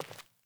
Sandals Walk Default; Characters; Player

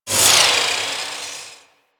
Saw Launcher Fire Projectile; Warframe; Saw Blade Launcher

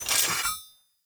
Two Handed Axe Equip; Weapons; Enemy; Axes; Sinecure Two Handed Axe

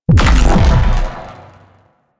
Briefcase Launcher Explosion; Warframe; Weapons; Corpus

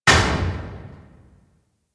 Mech Step Metal Accent; Warframe; Vehicles; NechroMech

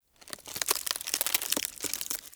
Tree Root Bark Crackle Slow; Ambience; Dungeons; Under City

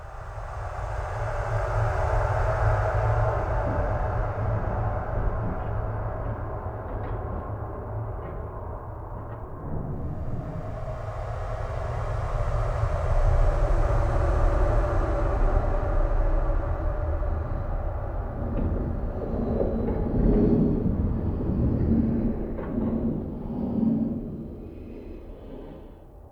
Under City Hallway Small Ambience Loop, Dungeons; Beds

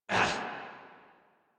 Pain; Warframe; Leekter; en

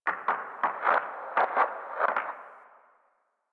Landscape Glacier Ice Distant Small, Ambience; Warframe; Venus Open World; One Offs

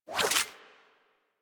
Alchemist Serpent Ability Tendril Retract; Warframe; DE; Warframes

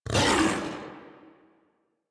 Feral Vocal Angry Attack Short; Enemies; Kubrow; Warframe

